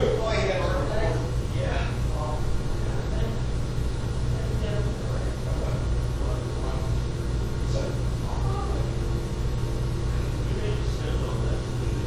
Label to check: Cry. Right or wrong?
Right